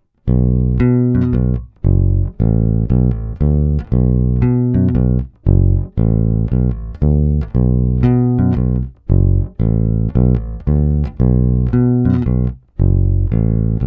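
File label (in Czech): Snímač u krku, Jazz Bass; Sadowsky NYC Ultra Vintage J Bass Guitar; Tónová clona plně otevřená, korekce vyřazené, hráno nad aktivním snímačem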